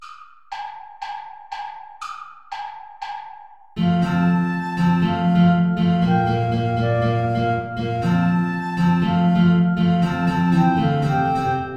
play along without guitar; traditional

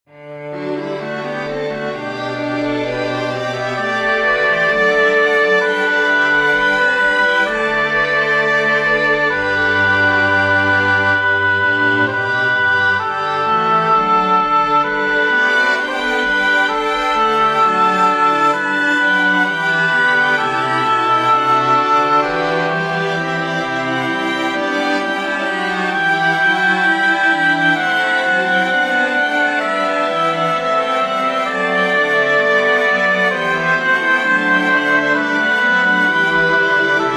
chorale amen amen komm for oboe strings; bach johann sebastian; scha freudenkrone

